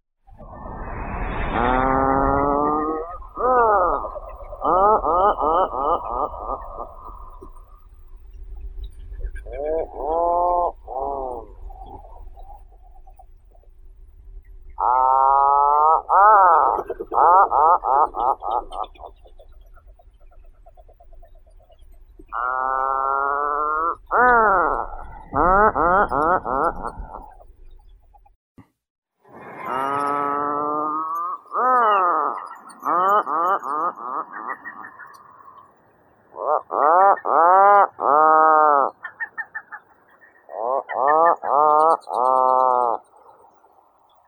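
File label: horned puffin, Fratercula corniculata; ipatka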